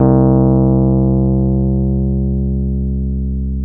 RHODES EP C1; Sonic Reality; Vintage Timetraveler; RHODES EP2

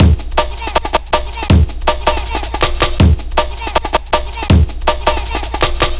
160, Drum Loops; Total Drum&Bass

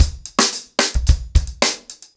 110BPM, ACOUSTIC DRUMS

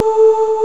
OOOHZ A3; East West - Global Sounds; M1

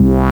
Basses Root C

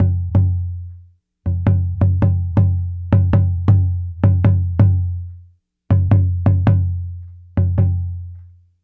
Tambora, African; Sonic Foundry (Sony Creative Software); Continental Drift Aftershocks

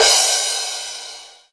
CYM CRASH, DRUMS; USB Soundscan vol.03 - Pure Electro; AKAI

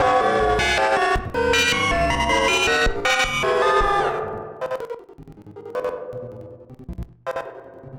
Battered Synth; malfunctioning synth samples

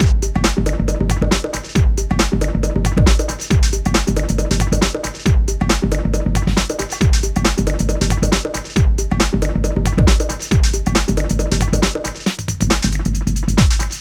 Processed Beat, Drums & Percussion

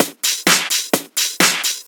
Straight Loop 128 BPM, House

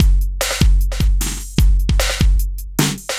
V Drum Loop; Sonic Foundry (Sony Creative Software); Electronic Point Blank; Drums & Percussion

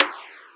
Percussions

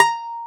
Domra A#4; EMU Planet-Earth

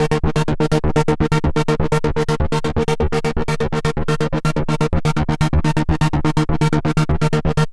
LFO 125, SYNTH SEQ; AMG; Global Trance Mission VOL-2

